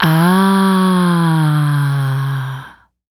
Human Female Sexy Ahh

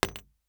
Weapon Bullet Shell 38 Drop On Trunk; AdobeCC

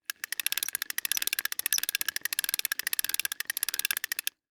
Drawbridge Crank; Foley

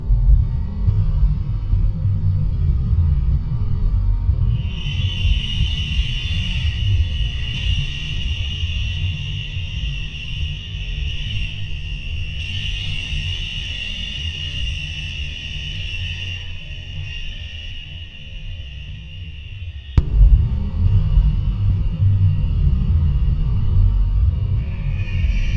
Drone Bed Slow Spooky Kick Drum; Production Element Imaging; AdobeCC

